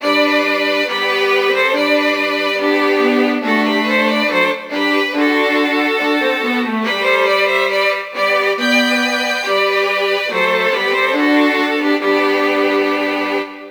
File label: 4 Violins; Loons The Fight for Fame; Xbox